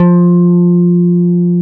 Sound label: FEND P-BASS F3; Sonic Reality - Vintage Timetraveler